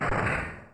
MOGUERA Roar; Trading Battle

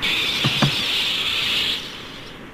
Roar; Kamoebas; Godman

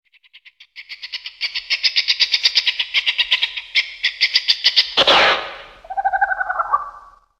roar; Kumoga millenium

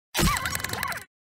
Baby Rock Bug roar

